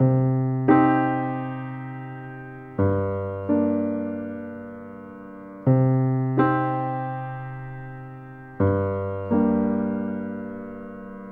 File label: piano, Loops Sad